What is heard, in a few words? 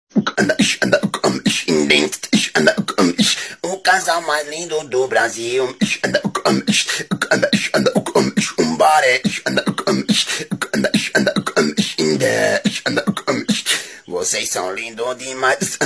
singing, brazilian